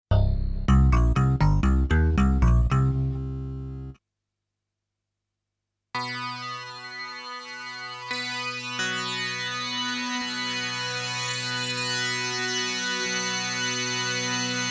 Resynthesis of Samples

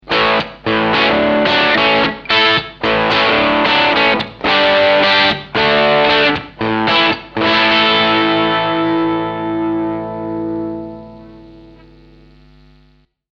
boost

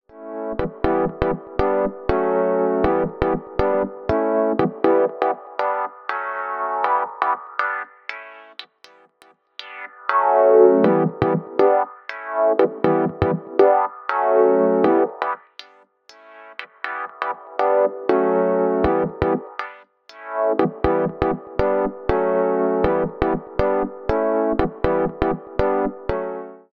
AKAI MPC LIVE II HPF

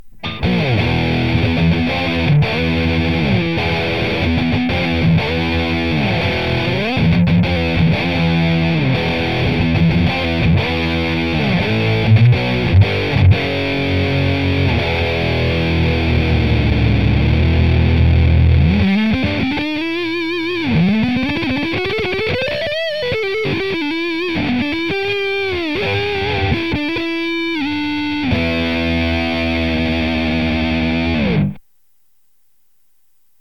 Rectifier Mic

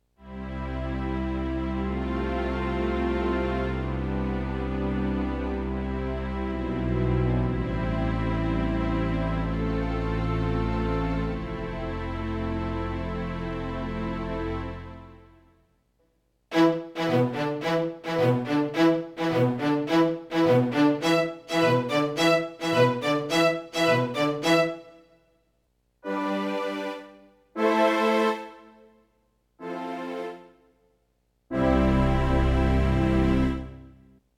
Strings

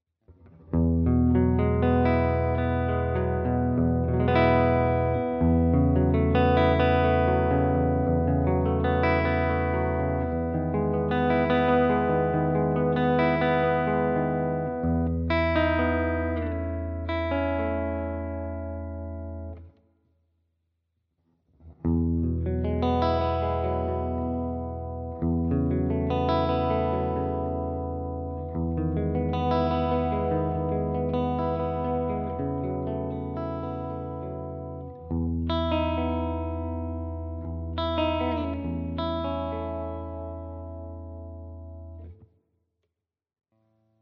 all pickup positions chords

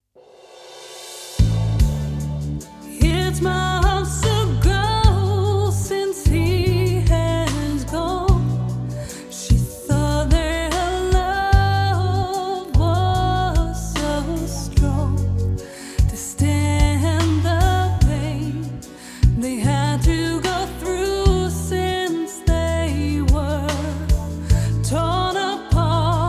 Reverb Relab Sonsig Rev A

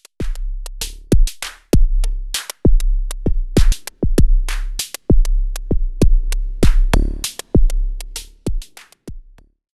Behringer Space FX Korg Volca Drum

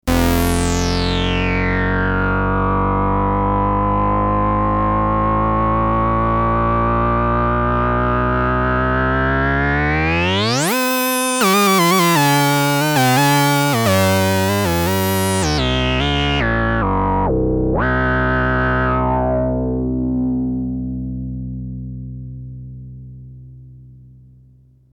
Cwejman S1 Lead mit Glide

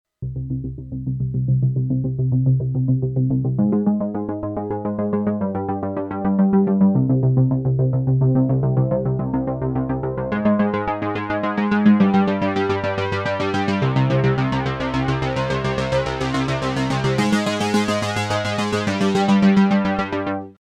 hydrasynth; kirk

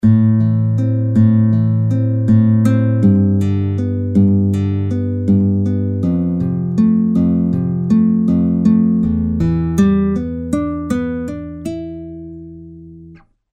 Guitar + EQ + COMP

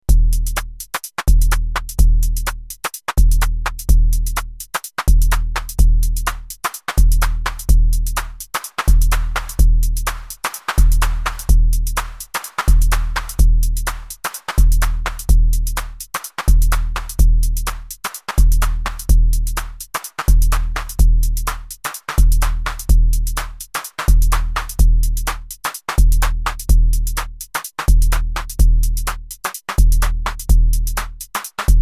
Clap

